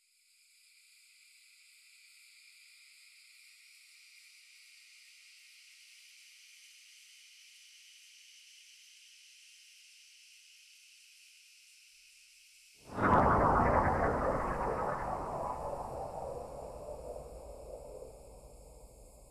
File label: crunch strata